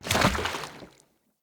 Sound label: Wolf Steps Land Water, Jump; Animals